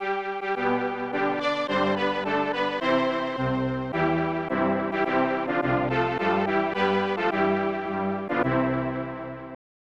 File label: National Anthem of Mexico